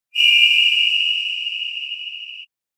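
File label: electronic grasswhistle blow sad; chiharu; anemoi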